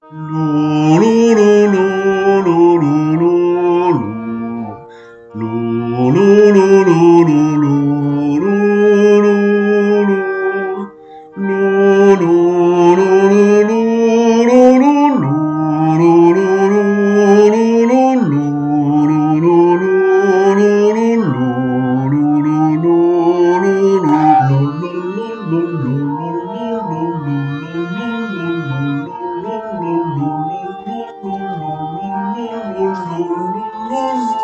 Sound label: Les 4 Saisons Hiver Soprano; Soprani